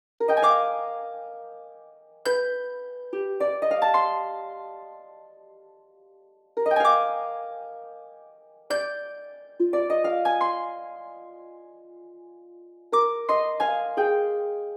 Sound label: harp